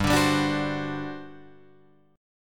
G Major 7th Suspended 4th, G, down, Guitar, Drop D